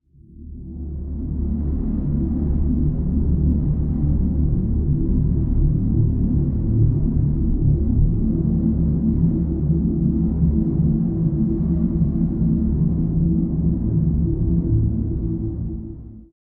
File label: Drone; Drones